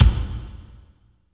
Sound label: KICK, Tekno Industrial; East West